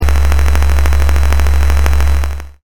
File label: Helicopter